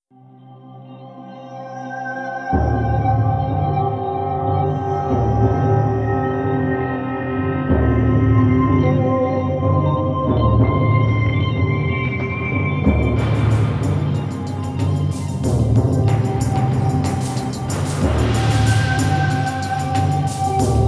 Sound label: Training Wall Music